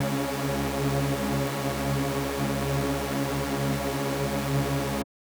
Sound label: SuperSaw C#4; KG MegaSaur; M8